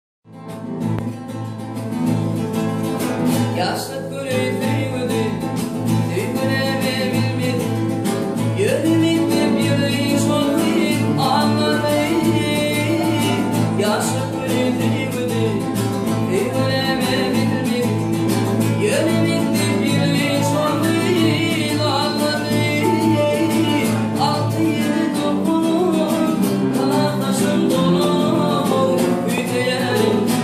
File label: turkmen gitara